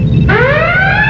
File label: ALARM